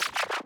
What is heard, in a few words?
switch